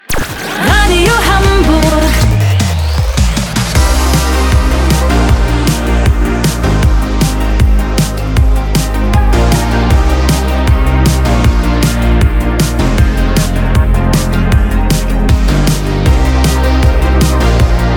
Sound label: Radio Hamburg Theme Fast